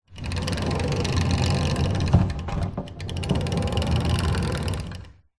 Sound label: household door wooden rolling screen roll; zapsplat